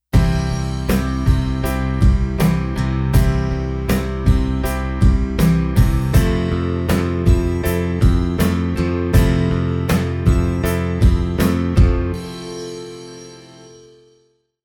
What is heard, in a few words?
c-fmaj7